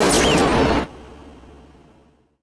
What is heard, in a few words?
slam; groundwar